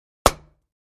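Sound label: 2x4 Smashed Sledge Hammer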